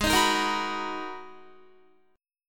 Gsharp Minor 9th, Guitar; G#m9; strummed; 15,18,15,15,18,19; 4F,4A,5C,5G,5C,6E; JGuitar

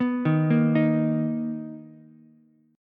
B Major 7th B down Ukulele; arpeggiated; JGuitar

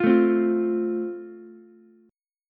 F Major, Ukulele; strummed; chord

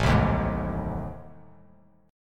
A Minor Major 7th Flat 5th, Bass Standard; strummed; AmM7b5; JGuitar

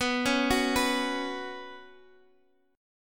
B Suspended 2nd, Guitar, Open D

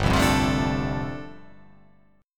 G 7th Suspended 2nd Suspended 4th

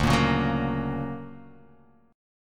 D Augmented Major 7th down, guitar

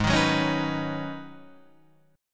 G sharp Minor 11th G sharp, guitar chord

